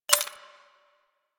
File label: Pistol Mech B; Bard